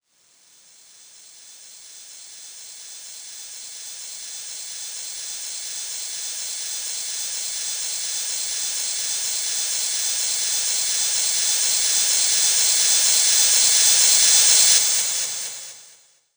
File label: FX Uplifter